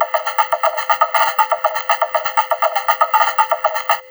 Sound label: RYTHM 120, Drum Loops Crazy Processed; USB Soundscan vol.07; AKAI